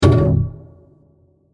Heavy Mangled Hit; Digital; Impacts

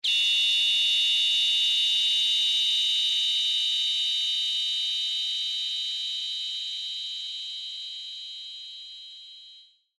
Laser Beam Cut High, Pitch; Lasers; BlastWave

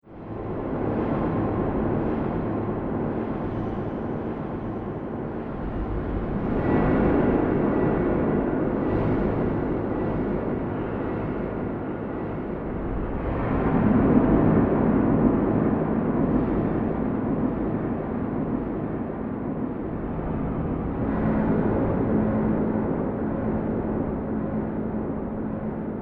Soundscape Underground Evil; Scary Soundscape Sound Effects

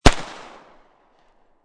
MP5 9mm Bridge, Machine Gun; Machine Guns; VRSonic

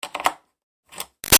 1-4 Inch Plug In-Out Guitar Amp; Guitar Amplifier; Switches; BigRoomSound

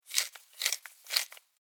Food Pepper Grinder Twist Fast; Household

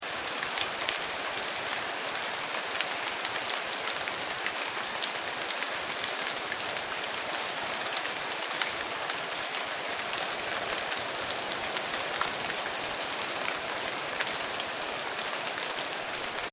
Jungle Rain Shower; Weather

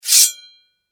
Household Knife Holder Remove Scrape Blade Metal; Kitchen

